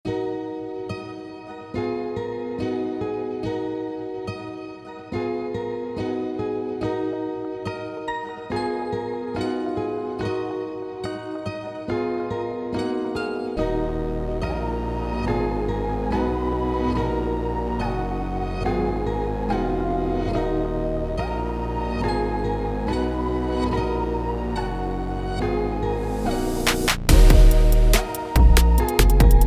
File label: beat, Trap; Hip Hop; Vex AQVA: Free Trap Sample Pack; Free Trap Sample Pack von Hip Hop Producer Vex